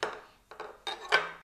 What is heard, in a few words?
drop cutlery